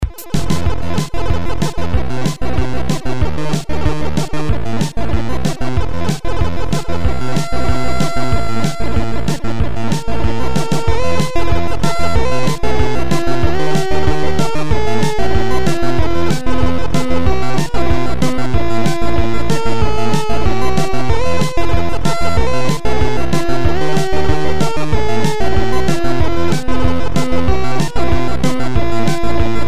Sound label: game tune; dualtrax pack2000